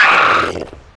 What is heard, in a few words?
injured